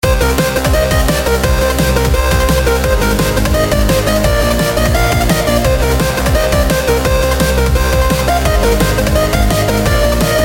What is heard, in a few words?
Drumnbass